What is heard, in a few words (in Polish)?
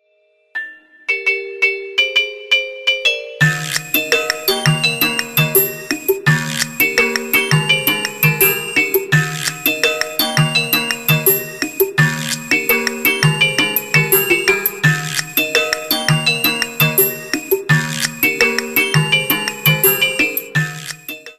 muzyka bez oplat